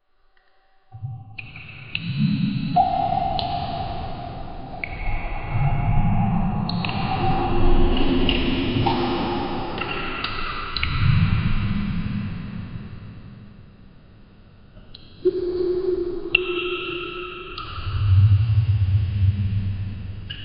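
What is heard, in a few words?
futuristic style with echo; cave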